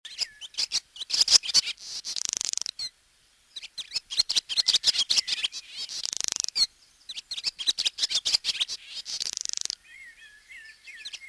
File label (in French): chant; cui-cui